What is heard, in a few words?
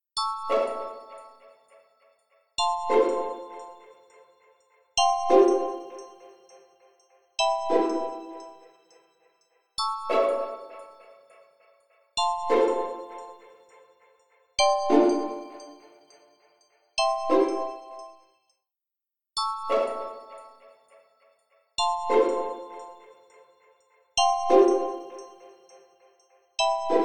Pizzicato Bells; toki tori 2